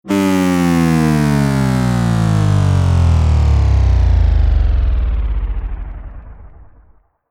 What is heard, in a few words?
Wizz Spin Down Slow Down Long Robot Future Digital; Sound Design; Warped Sound Effects